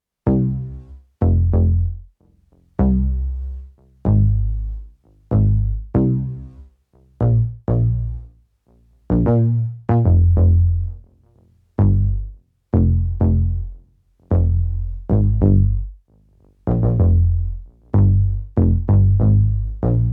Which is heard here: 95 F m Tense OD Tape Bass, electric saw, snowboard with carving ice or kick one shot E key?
95 F m Tense OD Tape Bass